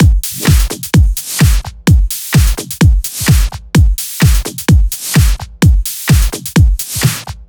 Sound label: 128BPM The Big Groove Kit; Vengeance Samples